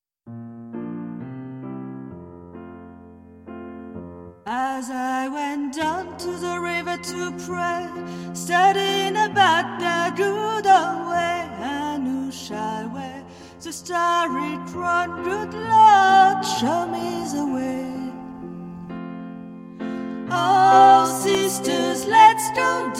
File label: Bass Piano